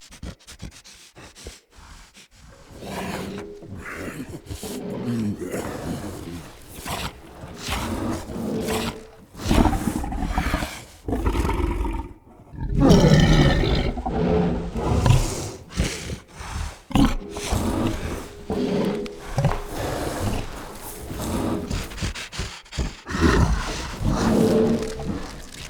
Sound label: Sap Drink Memory Grunts; Cinematics; Bromius; Bromius Quest Sap Drink Memory